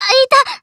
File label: Emo Pain